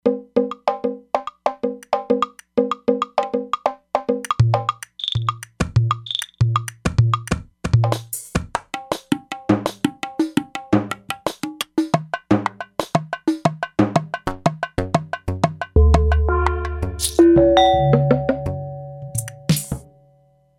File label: Percussion, E-mu Proteus-3; Factory patches